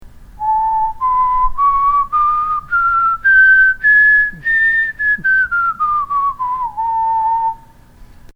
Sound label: Whistled Scale